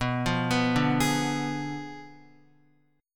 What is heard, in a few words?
B Diminished 7th, Guitar; Bdim7 arpeggiated; JGuitar